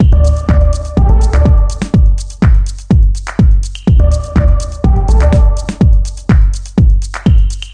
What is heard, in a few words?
House Music 127 BPM